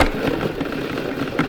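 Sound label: CABLE REEL, household; AKAI Anime Sound FX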